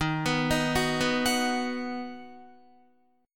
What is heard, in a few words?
Eb Minor Sharp 5th, Guitar; 11,14,13,11,11,13; 4E,4A,5D,5G,5C,6F; arpeggiated